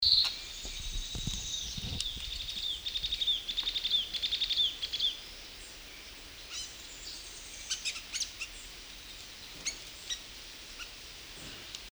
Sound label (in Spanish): fiofío ceniciento, cantos, aves